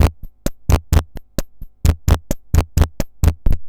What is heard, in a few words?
Crushed Beat 130BPM; musicradar